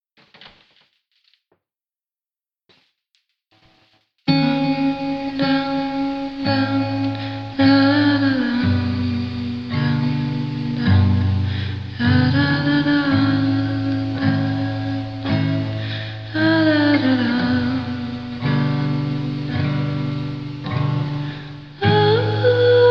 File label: Lullaby; birdsong